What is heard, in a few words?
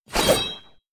sword spin attack; player; unsighted